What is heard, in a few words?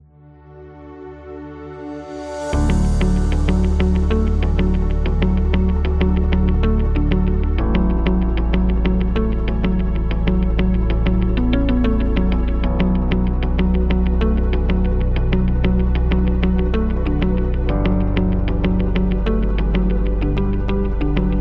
Hip Hop Corporate, corporate background music; corporation